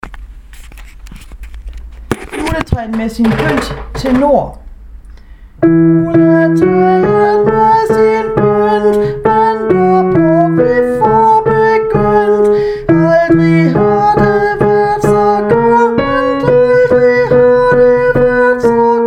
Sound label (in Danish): tenor; juletræet